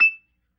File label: E5 PERC, B3